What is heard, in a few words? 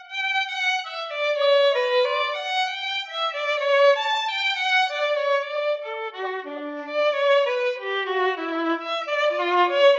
trio synth inst; oracle